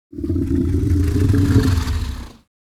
Dragon quick growl warning

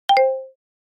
Alert notification

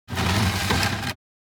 Light furniture sliding on floor moving

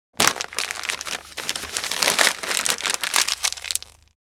Plastic paper handling

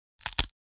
Body part or joint breaking

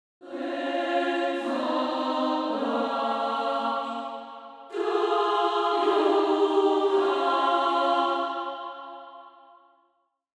Angelic choir angels; let the light guide your path

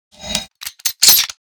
Sci-fi weapon reload rifle reloading

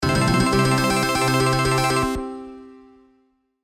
Slot machine casino medium win

